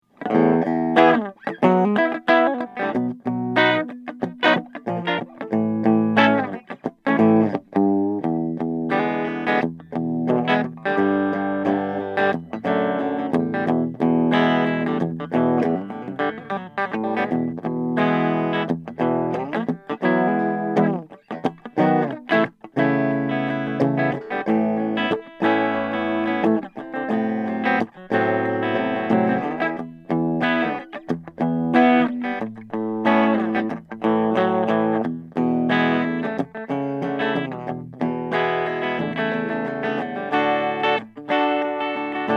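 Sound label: ibanez lr 10 sunburst, Guitars; 1980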